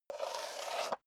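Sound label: step high heels concrete slide long, footsteps; schritte; menschen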